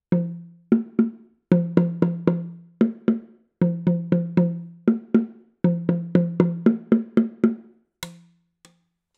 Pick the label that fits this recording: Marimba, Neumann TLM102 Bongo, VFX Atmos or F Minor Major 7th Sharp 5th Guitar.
Neumann TLM102 Bongo